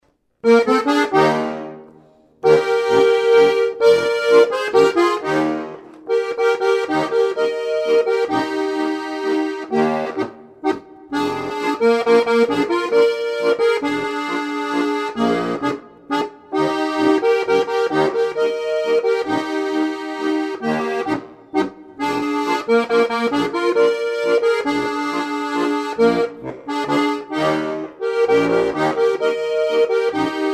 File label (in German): Hall; alpenlandische tanzstuckl